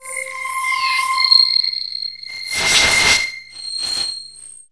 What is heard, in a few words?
synth c, Ambient Atmos; Dance Synths